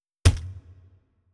Impact Gore

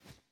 Flapping

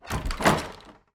Door close; Folk Hero

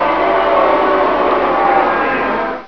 Finish lose; Muscle Car 3: Illegal Street

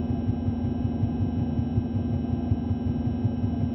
Fan

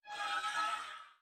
vine scream; enemies

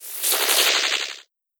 Jelly

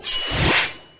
New Weapon; Imja New Weapon2

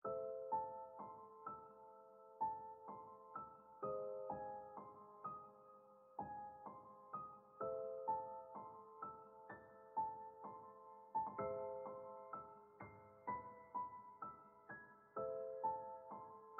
Mausoleum Music

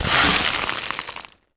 Ice Spell Freeze Frost

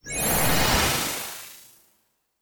Tiger Spell; Chasse Tiger Spell; Legend of Keepers

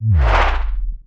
Basketball

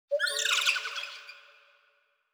Cave Spirit

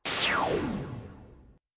Magic laser

